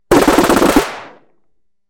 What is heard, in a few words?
fart machine gun